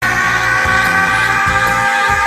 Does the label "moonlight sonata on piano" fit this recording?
No